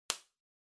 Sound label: Bubble Wrap (Pop Distant); 気泡緩衝材01-07(プチッ 遠い); 効果音＞梱包材